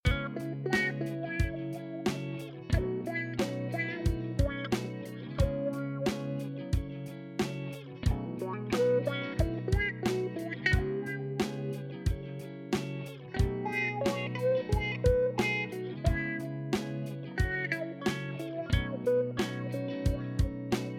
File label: Funky Song